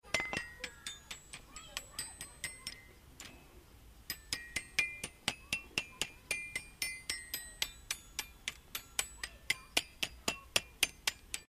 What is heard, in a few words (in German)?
mini-glockenspiel